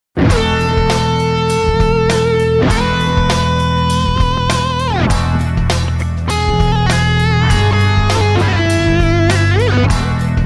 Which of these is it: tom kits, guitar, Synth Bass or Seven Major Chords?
Seven Major Chords